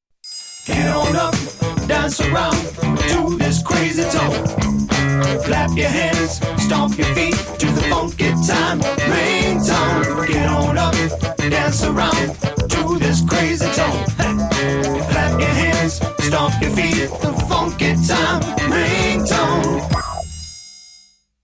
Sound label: funky time ringtone